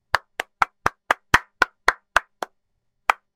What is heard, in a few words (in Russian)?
neskolko dubley hlop hlop hlop, zvuki kinohlopushki